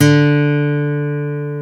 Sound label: GTR D3, Dual Guitar; Club-50 - Foundations; Paul